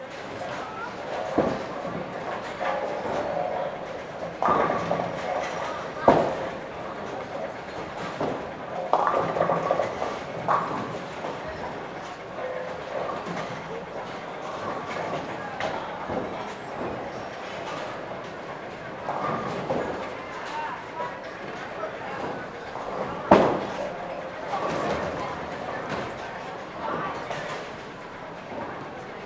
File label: Alley Ambience, bowling; fastlane bowling